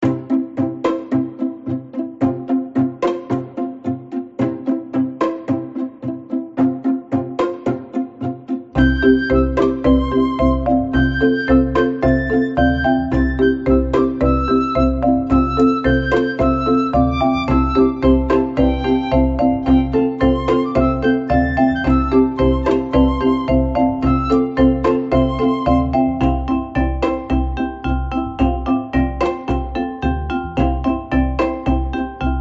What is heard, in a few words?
theme